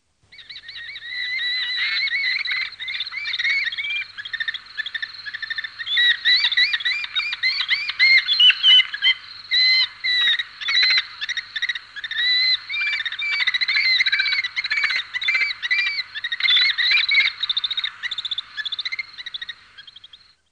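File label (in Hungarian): Kék vércse; Madarak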